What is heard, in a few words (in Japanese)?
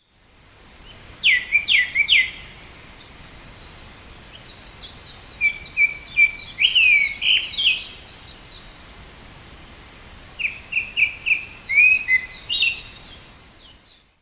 kuro, singing